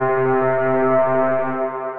Feedbacking Pad, Ethereal Pads; Instruments; Ableton; Mood Reel

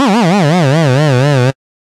Game Over, Operator Effects

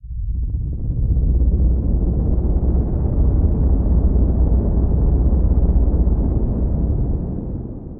Drone; Laboratory; Parsec Breach

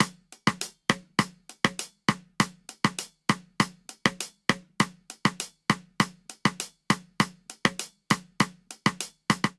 Reggaeton Snare & Hihat 100 bpm; 4 4; MIDI Clips; Ableton; Factory Packs; Drum Booth

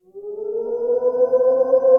Dimm Pad, Ominous Pads; Ableton; Mood Reel

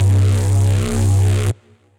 Carrier Sky, Bass; Ableton; Build and Drop

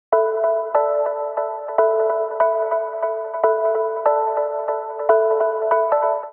Chords D Minor 145 bpm; MIDI Clips; Ableton; Factory Packs; Skitter and Step

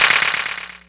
Clapbox Pitch 18; Ableton Foundation FreePacks

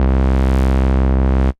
Analog Bass; Ableton; Retro Synths; Analog Bass Vol 1

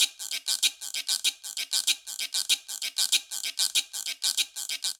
Son Guiro 192bpm, Latin Percussion; Ableton; Factory Packs

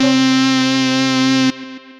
Double Lead, Retro Synths; ModW; Ableton; Factory Packs; P-50 Linear

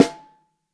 SN3 Top, snare

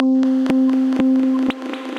Subs Poppers, Effects

